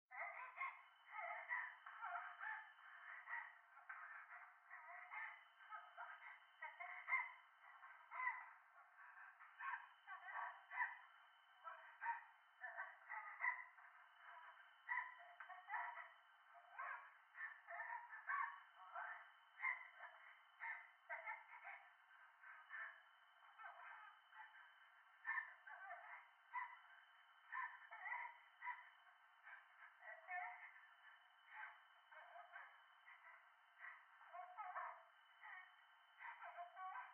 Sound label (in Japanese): トイレ 喘ぎ 山奥